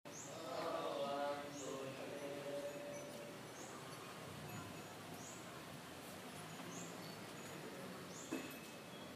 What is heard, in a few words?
Afternoon Call to Prayer: Galle, Sri Lanka